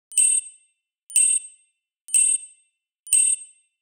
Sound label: digital timer